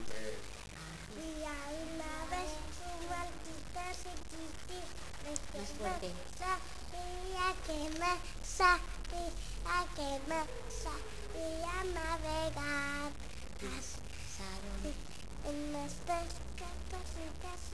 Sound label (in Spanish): canta lo del barquillo; barco